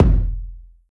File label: round kick single hit f key; kick drum samples